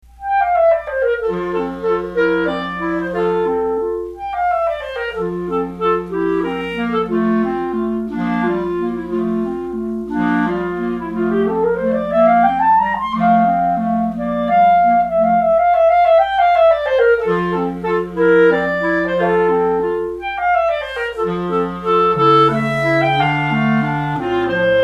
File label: Sonata Piano; Schubert